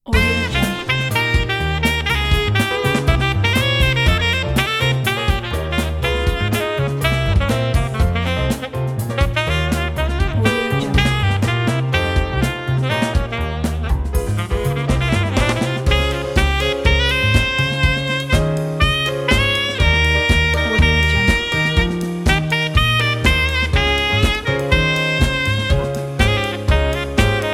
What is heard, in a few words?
acid jazz